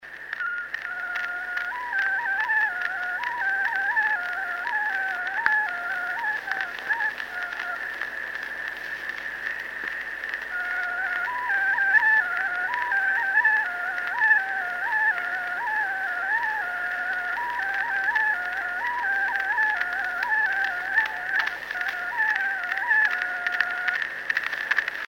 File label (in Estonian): Pillilugu Kuusalu pajuvile folk